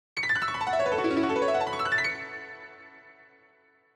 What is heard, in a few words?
piano gliss